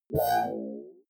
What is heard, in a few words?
query smooth high, signale